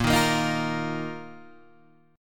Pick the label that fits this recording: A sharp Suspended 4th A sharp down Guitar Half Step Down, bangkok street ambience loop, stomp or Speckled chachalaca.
A sharp Suspended 4th A sharp down Guitar Half Step Down